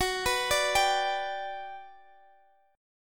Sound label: G Major F sharp, chord